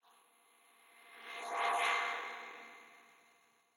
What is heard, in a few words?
drone fly by; SoundsCrate